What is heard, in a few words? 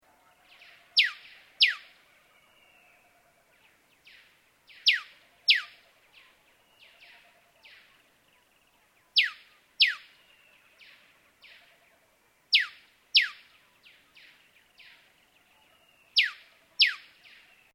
yellow robin